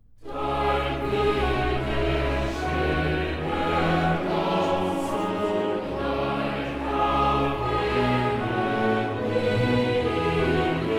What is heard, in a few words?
Choral; Dein Wille geschehe, Herr Gott, zugleich; Klassika; Bach, Johann Sebastian; Choral Works; Johannes Passion BWV 245